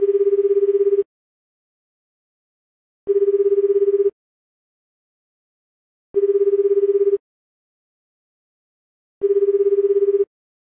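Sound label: volte vt ringback tone; Samsung Galaxy S8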